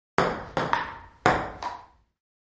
Ping Pong Serve Ball

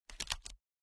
pager holster

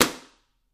Book Slam Slap Hard

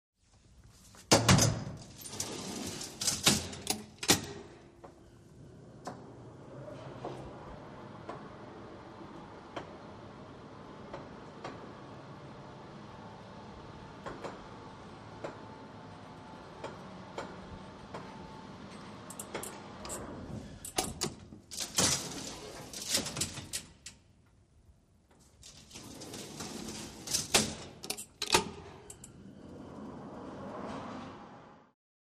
Interior Warehouse E, elevators

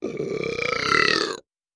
Gross Strained Belch; belching

